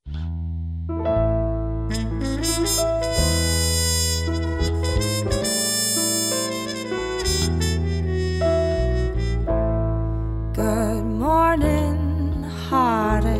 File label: (Vocal), Swing